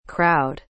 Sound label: crowd